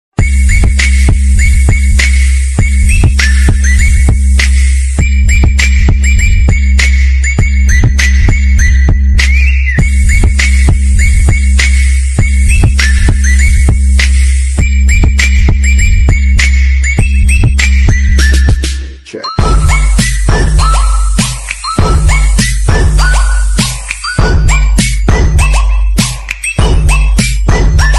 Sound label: jingle bells ringtone; chritsmas special